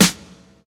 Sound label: modern shiny steel snare drum F sharp key